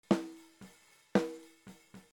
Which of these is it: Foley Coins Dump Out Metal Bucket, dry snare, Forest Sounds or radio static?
dry snare